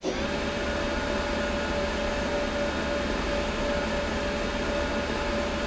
Aircrafts Bf-109K-4 Fuel Pump; DCS

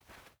footstep burlap cloth walk; Rust

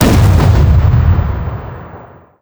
Explosions Explode Air; DCS

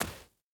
stag footstep sand hard; Rust

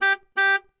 Horn; Civ4 warlords

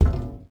glass walk

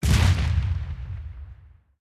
ambient port; World Of Warships; port3d

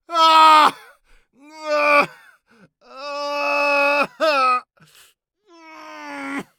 agony; Escape from Tarkov

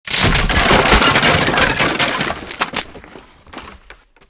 wood crash; tanks; War Thunder

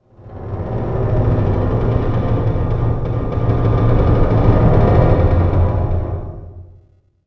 emitter metal stress

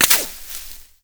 impact foliage; Modern Warfare

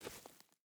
footstep burlap dirt walk; Rust

